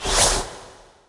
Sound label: Music Frontend